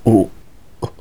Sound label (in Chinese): 死亡, BattleCry; 南宫三八